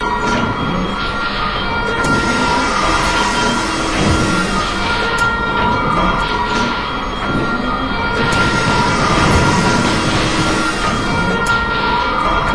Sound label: factory, ambience